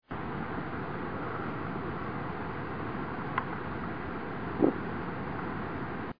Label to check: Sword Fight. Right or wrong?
Wrong